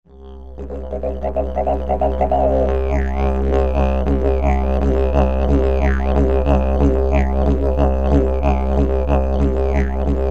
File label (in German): Agave, Didgeridoo, mit einem wunderbar tiefen Ton; sieht ein bißchen aus wie ein Ast